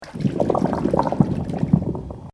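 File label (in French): eau soufflée; residence MDQ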